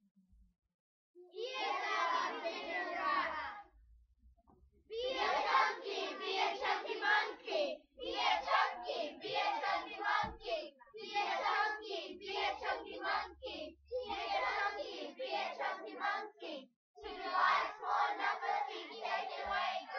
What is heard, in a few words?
rap